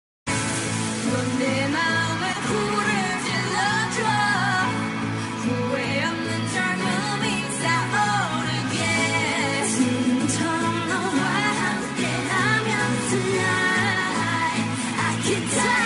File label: korean song